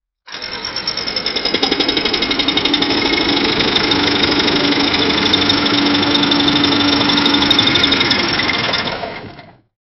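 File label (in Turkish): traktör makine, lowering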